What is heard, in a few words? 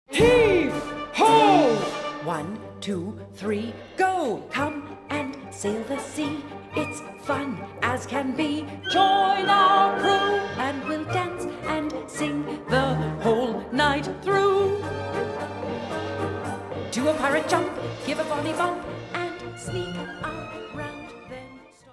Persian March